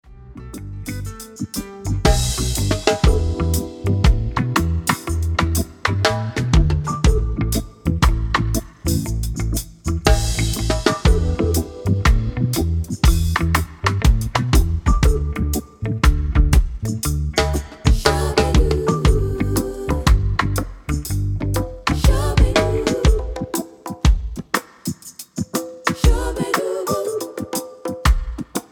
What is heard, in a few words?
drum bass